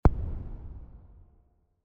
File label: Distant Explosion; castelloe